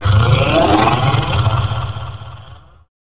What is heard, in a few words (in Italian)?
ruggito